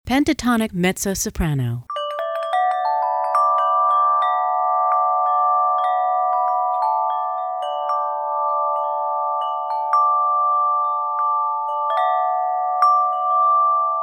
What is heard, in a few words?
Pentatonic Mezzo Soprano